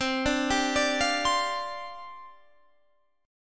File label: C Suspended 2nd Suspended 4th, down, Guitar, Standard; Csus2sus4 arpeggiated; JGuitar